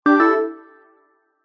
multimedia alert simple basic warm soft synth positive